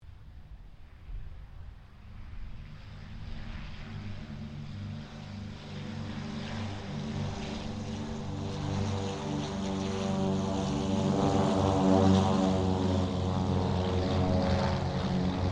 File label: CT4 squadron 5 takeoff, Squadron of 5 CT4s; Airplanes; Vehicles